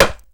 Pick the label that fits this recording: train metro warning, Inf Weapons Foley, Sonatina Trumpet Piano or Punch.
Inf Weapons Foley